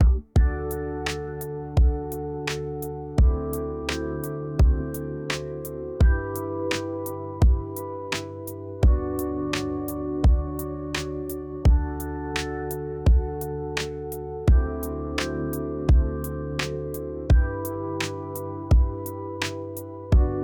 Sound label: window breeze, relax, BPM 85 / Key A#; TinyBGM